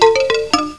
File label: appear